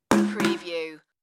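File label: Tupperware Drop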